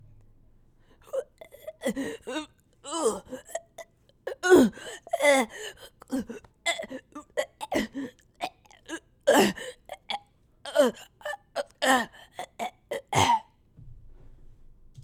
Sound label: Choking